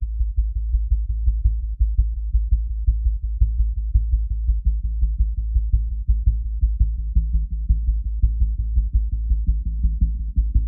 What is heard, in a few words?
Drums Bass Swells and SFX Only